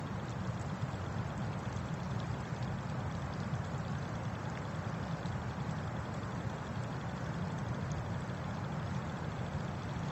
Glacier Ice Bubbles and Creaking HIGH PASS 80 Hz; alaska acoustic highlights; GEOPHONY

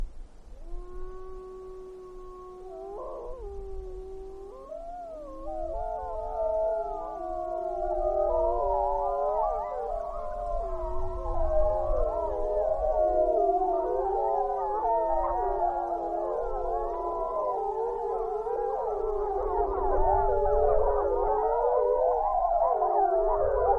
Group Wolf Howls; alaska acoustic highlights; MAMMALS

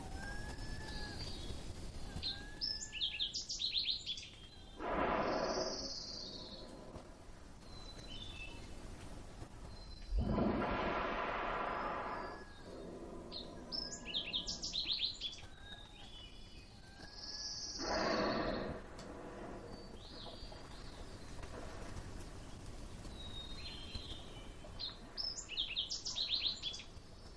Humpback Whale Breathing Sequence; alaska acoustic highlights; MAMMALS

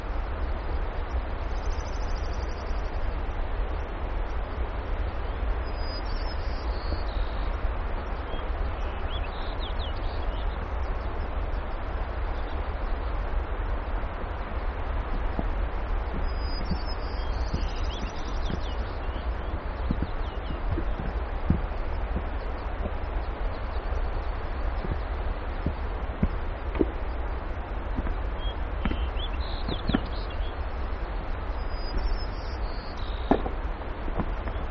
Small Rockfall With Avian Chorus; alaska acoustic highlights; GEOPHONY

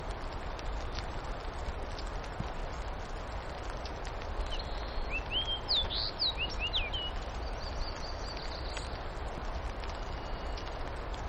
Avian Chorus With Rain Showers; alaska acoustic highlights

